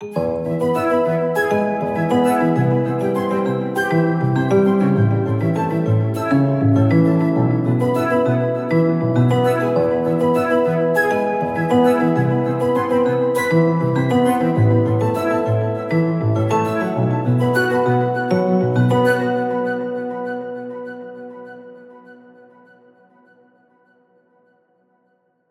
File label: Flutes & Celesta E 100BPM; Construction Kits; STEMS; Ghosthack; SkyRealm; Kit 15 - Emerald Gates